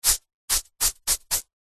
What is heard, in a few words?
human skin scratch slow; zapsplat